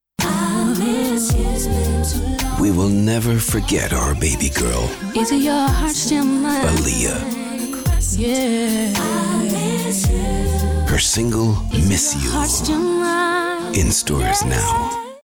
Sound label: Rock